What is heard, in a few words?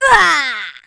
death; alienarena; invader